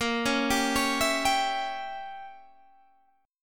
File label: A sharp Diminished 7th Guitar Open G; A#dim7; arpeggiated; 20,18,17,18,17,17